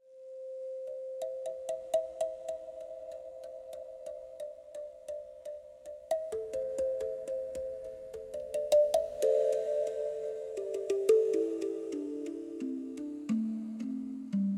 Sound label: spirit of the rainforest a ambient nature score soundtrack; jungle-ambience